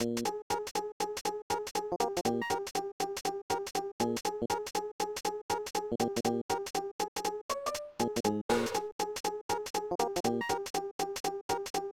Bonus; Rayman; Pocket PC

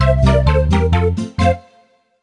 Music Mini Game Lose; Littlest Pet Shop 3 Blue Team Pink Team Purple Team; NDS

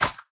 Elite harassers Arrow storm Single; Elves

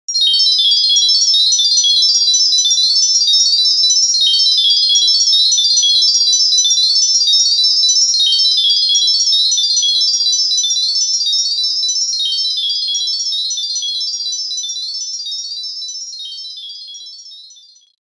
Magic Jingle; Banjo-Tooie

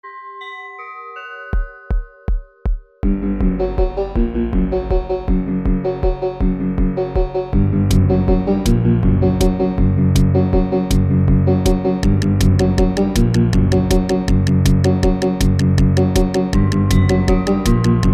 Piglin March; Hornycraft

